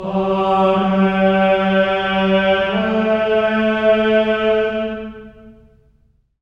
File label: amen; Spectrasonics; Symphony of Voices; GR AMENS